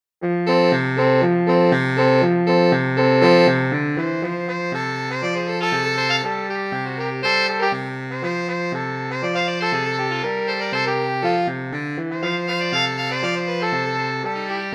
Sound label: Camptown Races Sax